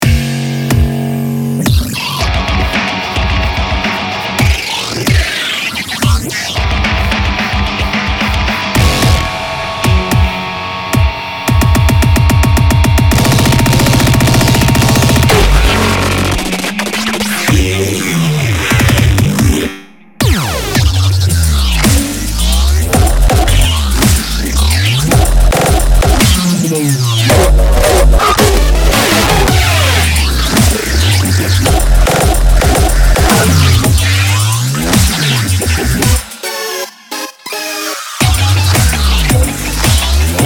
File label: dubstep; weeklybeats-2020